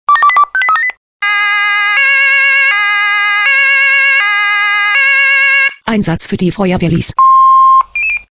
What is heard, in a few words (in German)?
SMS Alarmierungstone; FW Lyss